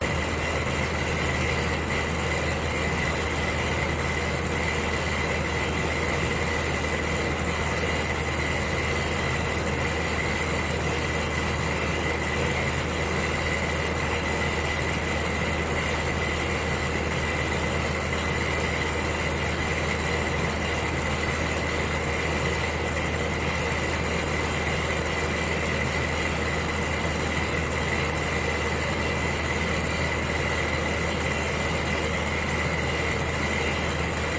electronic heater; la paz; your free sounds

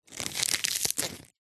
food spinach leaves crush squash twist; zapsplat

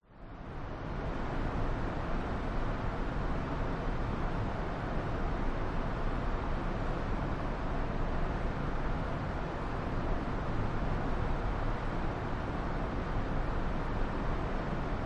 school classroom music room roomtone silence hum

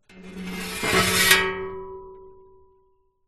metal scrape blade ceiling fan; foley

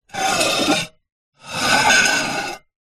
household bowl ceramic scrape on dish

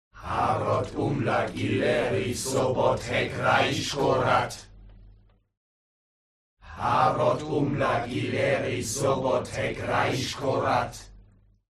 HUMAN CROWD Evoke Normal; smartsound

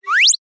cartoon slip trip high pitched fast

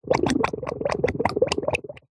cartoon slime bubbles; zapsplat

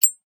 hand grenade pin pull; sound effects one

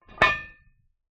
industrial manhole cover metal close

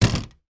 household bookcase glass panel door close gently

